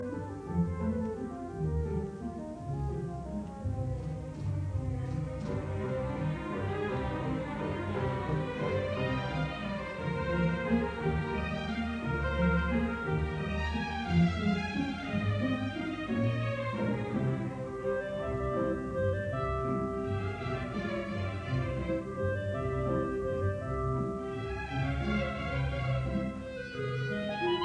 Symphony in C minor In ruhig fliessender Bewegung; Symphony No 2; Resurrection; Bruno Walter

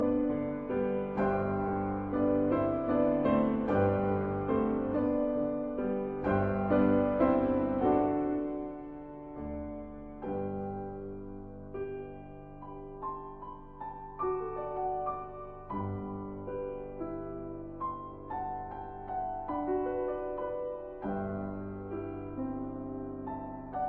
Pastorale in G major; Angelus; Corelli

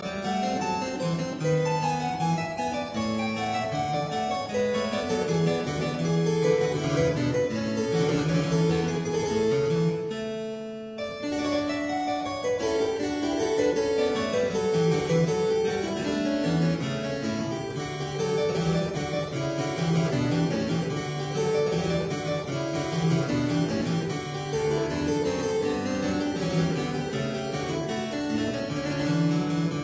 Suite in E flat major, Allemande

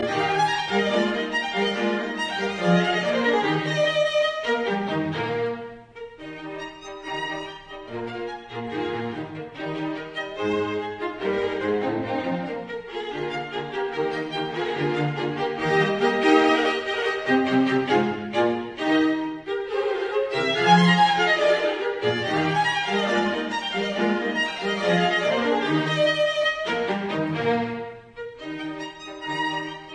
String Quartet in B flat major, Scherzo Presto; Haydn; Op 2 No 6

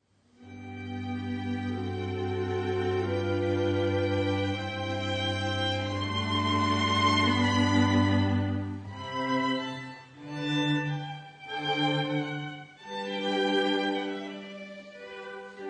Viola Quintet in D Adagio

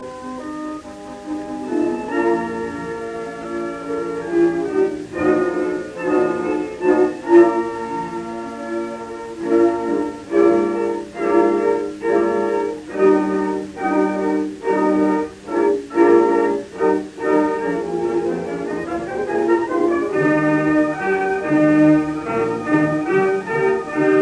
Symphony in E flat major Allegro con brio; Beethoven; Op 55; Eroica; Oskar Fried

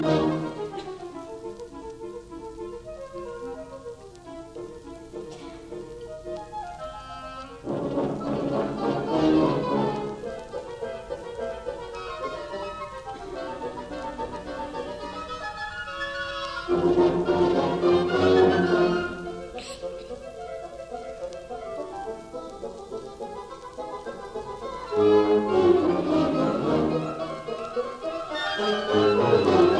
Geschwindmarsch, Symphony Orchestra; Hindemith; Symphonia serena; by Beethoven; Philharmonic Symphony Orchestra

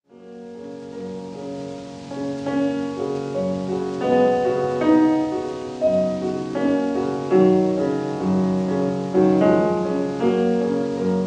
Romance for piano in F sharp major; Schumann; Einfach; Op. 28

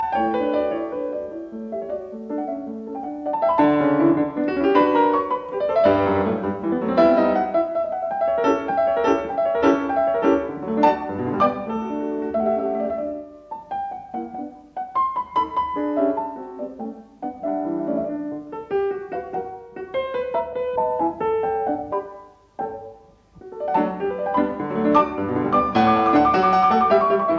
Piano Sonata No 5 in G major K 283 III Presto; Lili Kraus

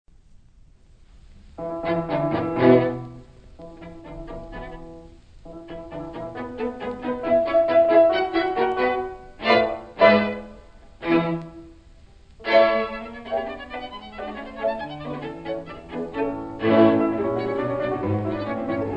Piano Quintet in A Finale Allegro; Dvorak; Op. 81

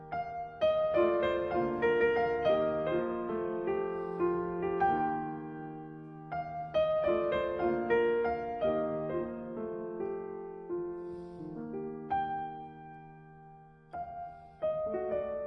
Piano Sonata in G minor Rondo Presto; Op 22; Juana Zayas

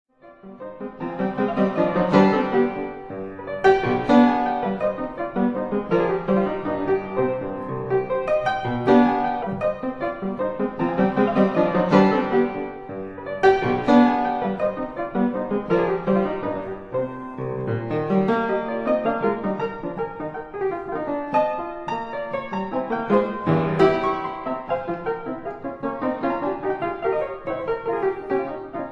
Etudes for piano, C minor

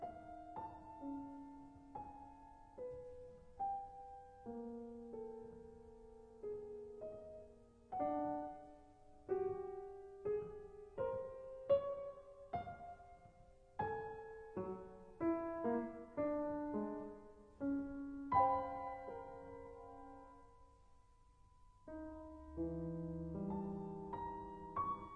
Fugue in B major; 6 Preludes and Fugues; Fugue No 6

